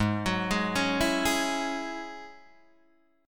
G sharp 7th Suspended 4th Sharp 5th G sharp, Guitar Open D